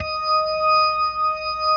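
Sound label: E flat 5, B3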